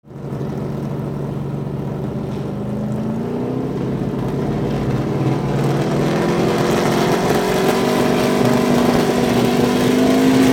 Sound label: nascar chevy rev